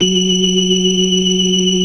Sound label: organ; Keith Emerson Signature Series